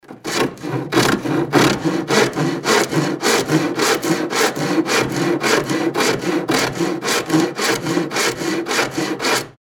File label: sawing wood